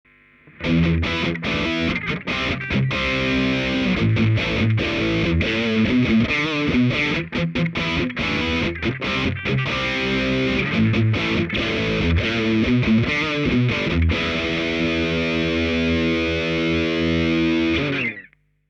Beyerdynamic M130 E Gitarre

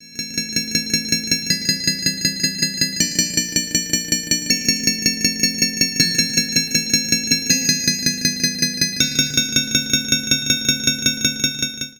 FM Synth Glocken; Sonicware ELZ 1

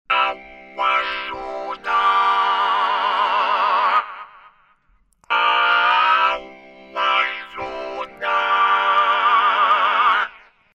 Dunlop MXR M222 Talkbox akkord

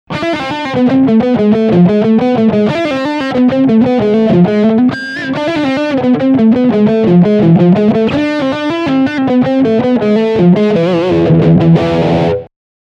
Lick Steghumbucker

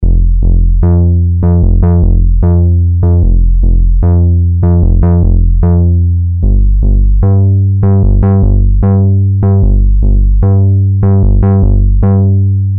Monark Basslauf

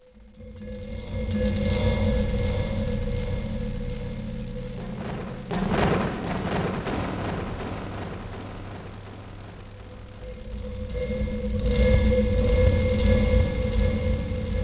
Hall federspiel; FieldKitFX

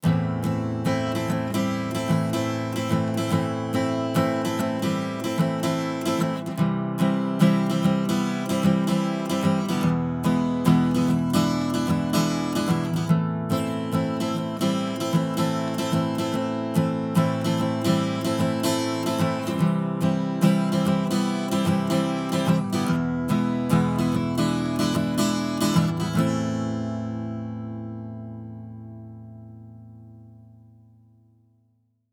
Gitarre ohne EQ; Gyraf Audio G14-S

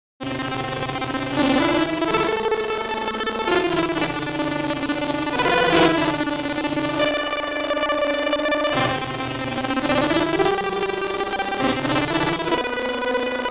brutzel Sound; bx oberhausen

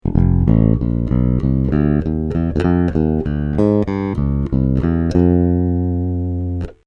Corvette Steg Single

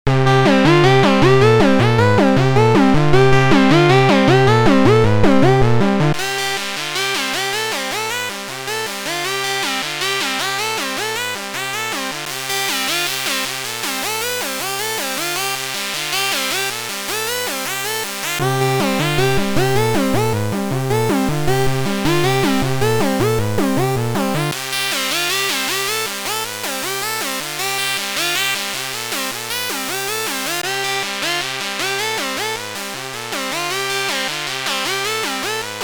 Duophone Sequenz alle Filtertypen

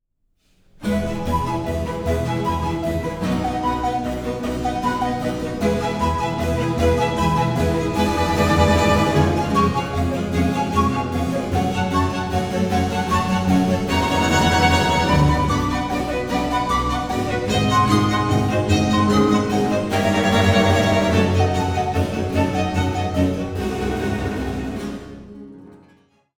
Barock

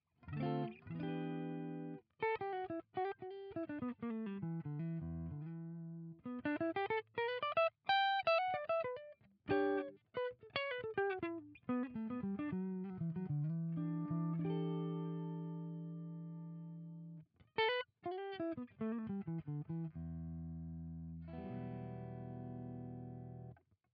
Harley Benton CST 24HB clean hals

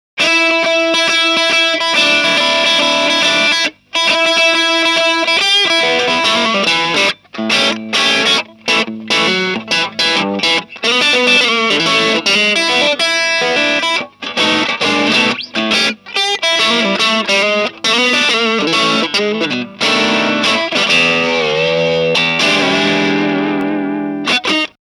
boost full ton

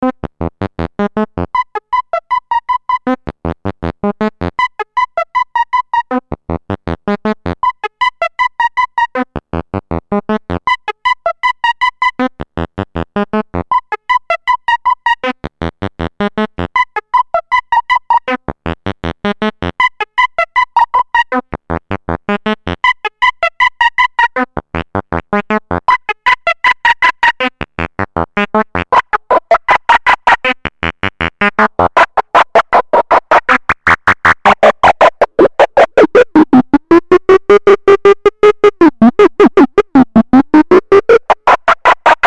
Klangbeispiel Gamma komplexerer Patch

